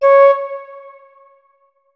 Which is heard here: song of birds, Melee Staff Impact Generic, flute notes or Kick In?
flute notes